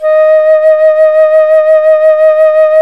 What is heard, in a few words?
FLUTE, Flt vib; C Flutes 1&2; Roland L-CDX-03 Disk 1